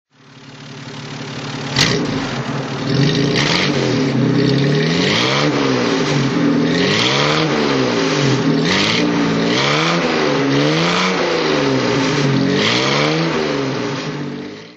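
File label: jaguar xk150 34 s ots throttle, Engine sound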